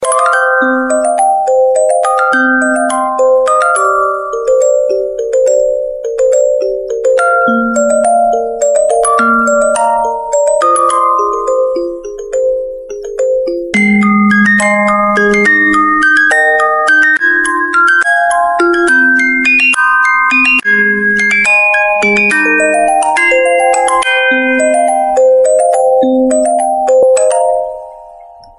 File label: Theme Music Box